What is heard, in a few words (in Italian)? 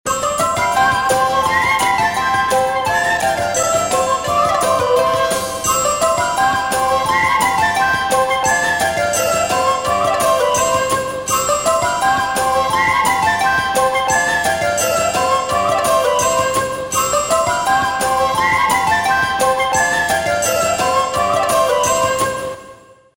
jingle; Jingle della ruota - Pranzo 1987/88